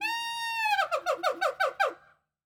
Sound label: monkey laugh; boss